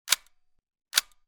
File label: weapon gun ar 15; still north media